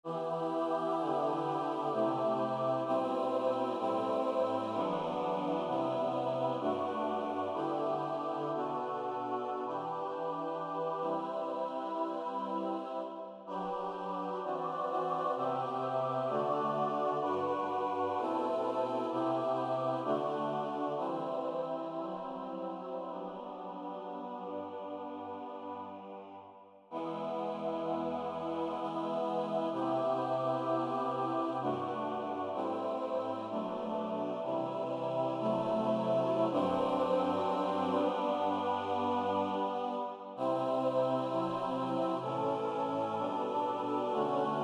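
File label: SATB, E minor; O Look At Me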